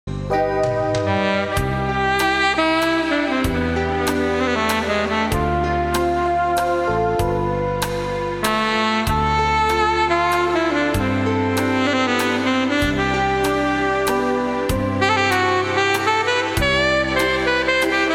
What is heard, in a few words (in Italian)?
sax innamorato